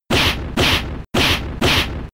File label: Double Hit 2 hits